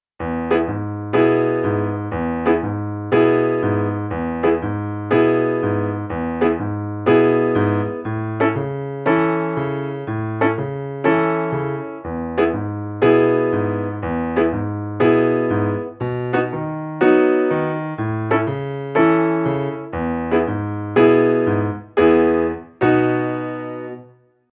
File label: blues